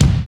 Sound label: kicks; Dance; DRUMS